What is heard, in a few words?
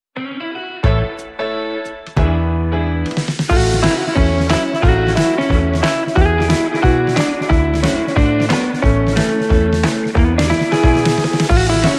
Guitar Jive Boogie